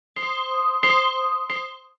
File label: Train Alarm; blue archive